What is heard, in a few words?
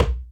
Conga, Percussion, Yamaha DTXpress